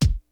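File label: kick, Hip Hop DRUM; InVision Interactive; 40 Oz Phat Slammin Hip Hop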